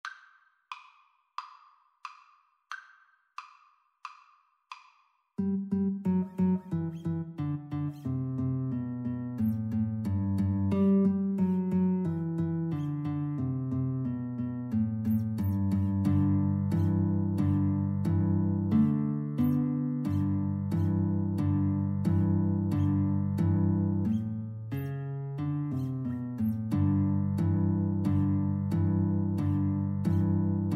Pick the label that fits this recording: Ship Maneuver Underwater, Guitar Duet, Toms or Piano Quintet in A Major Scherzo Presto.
Guitar Duet